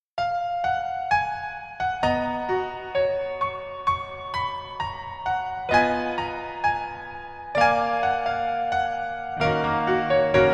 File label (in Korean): Piano ver 멜로디MR 여자키